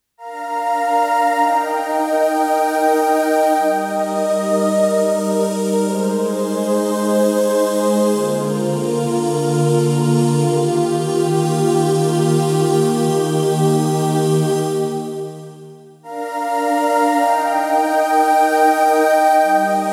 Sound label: vox pad